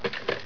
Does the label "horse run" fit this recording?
No